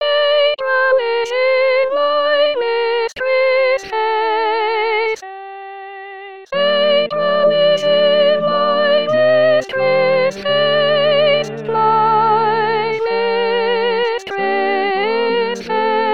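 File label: April is in my mistress face Soprano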